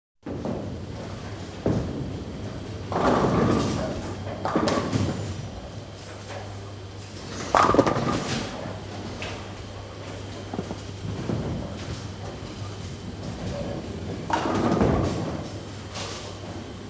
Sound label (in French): Bowling; bruitage